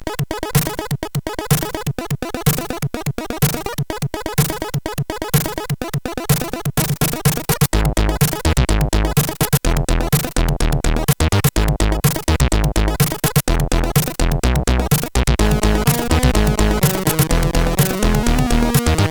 music, sid, CSG8580R5

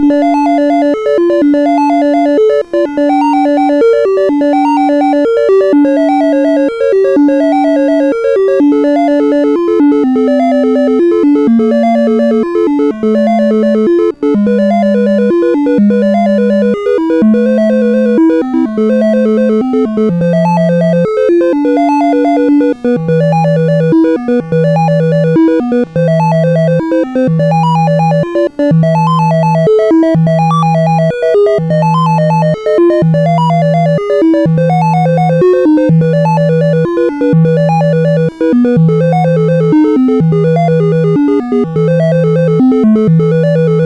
sid CSG8580R5, GAMES; Firequest

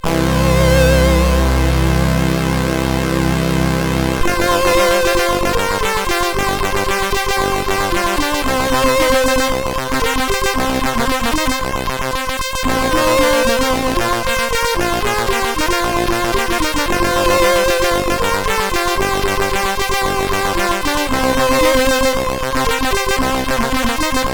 E-Load loader, CSG8580R5; hvsc

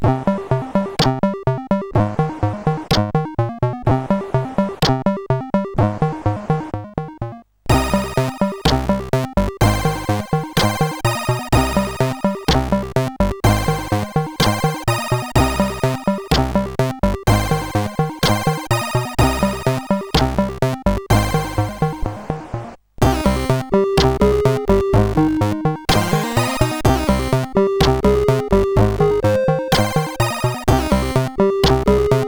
CSG8580

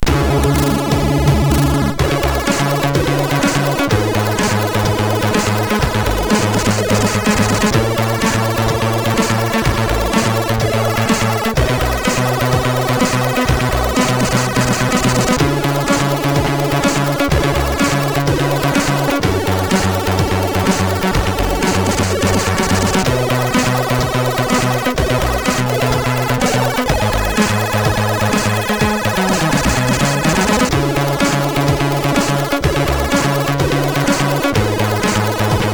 Hawkeye loader, MOS6581R4

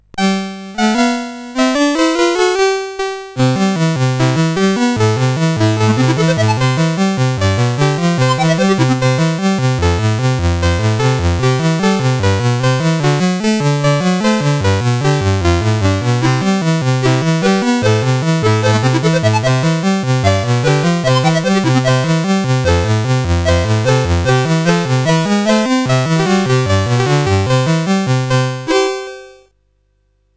Marine Song, sid CSG8580R5; Master Composer

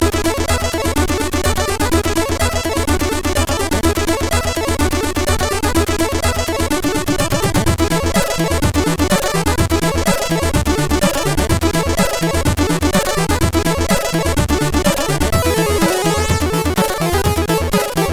My princess, CSG8580R5

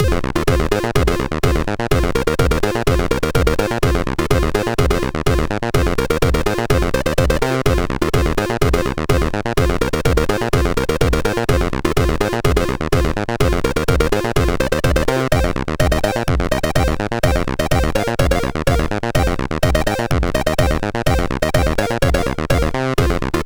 Race, sid, MOS6581R3

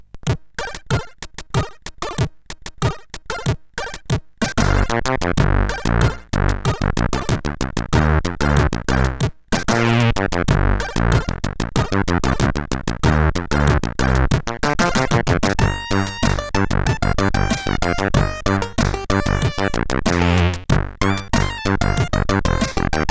CSG8580R5

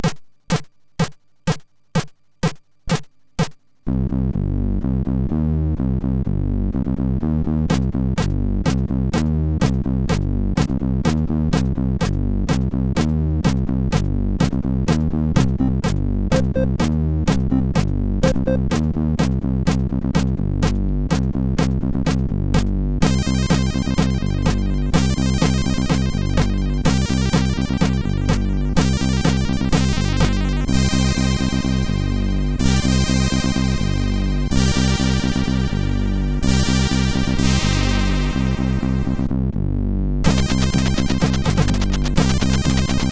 Disco Fever, MOS6581R4

